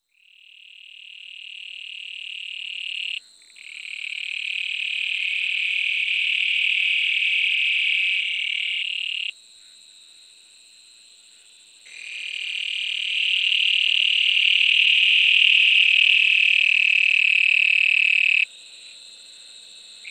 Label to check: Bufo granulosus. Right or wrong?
Right